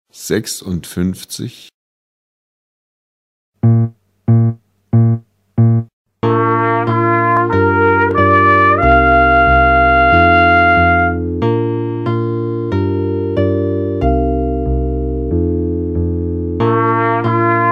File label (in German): Klang, Hornschule; 5 verschiedene Horntoene